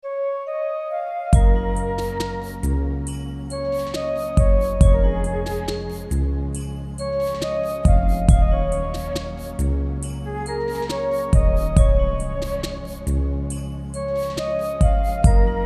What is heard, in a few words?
AMBIANCE; Soundfont